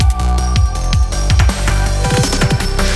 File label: Snow Transition; sky rogue